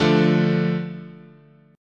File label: D Minor, chord